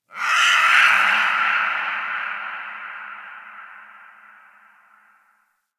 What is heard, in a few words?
Creature Distant Mid; Ambience; Misc Outdoor; Warframe; Infested Eris